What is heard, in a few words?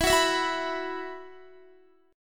E Suspended 2nd, Guitar; Esus2; strummed; x,19,18,21,18,19